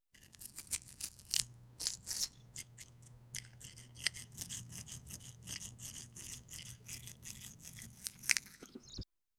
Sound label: chopping